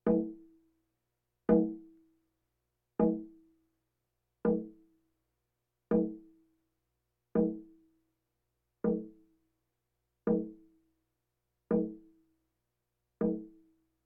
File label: Percussion Bongos Tympani rolls Gongs Drums; Valentino Production Sound Effects Library CD50